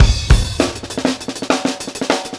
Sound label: Bon Bon Kit 1-Bar Fill, Drum n Bass; KJ Sawka - Drum n Bass & Beyond; Beats and Percussion